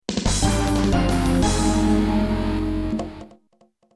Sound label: Music Game Over